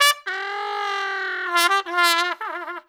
Trumpet Noise

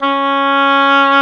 OBOE C#3; E-MU Formula 4000 Series Vol. 5; Protozoa; Proteus2 Instrmt

